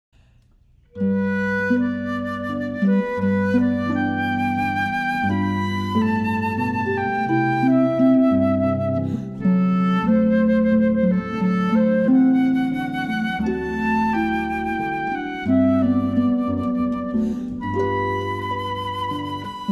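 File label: Maui Waltz Harp Flute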